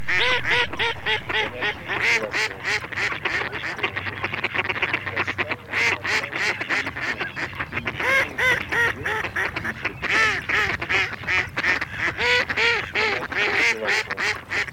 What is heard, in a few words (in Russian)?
Кряква микс Germano mix; plurifon